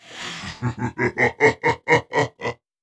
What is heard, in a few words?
voice monster